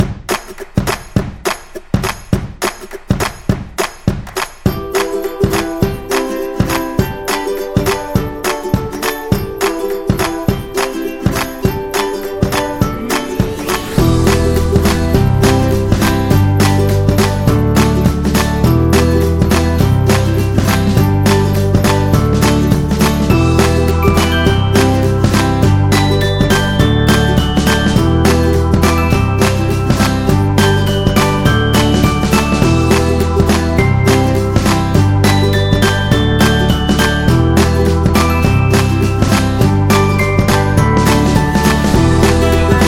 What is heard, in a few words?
Ukulele Commercial; happy music vol 2